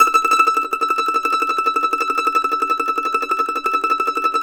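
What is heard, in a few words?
mandolin tremolo E6; Sampleheads; Whole Lotta Country 1 & 2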